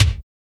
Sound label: CONTROL KIK; Drums In Your FACE!